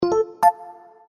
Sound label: REMINDER, Sony Ericsson; Z555i